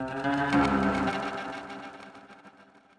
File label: ethno, 160, C